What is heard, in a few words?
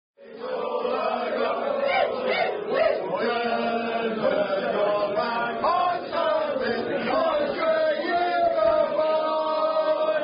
Unknown Rugby Song; Sunshine Mountain - Birstall Rfc Iw Tour 2010